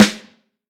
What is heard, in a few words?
Vintage Snare Drum